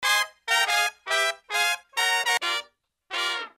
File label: brass loop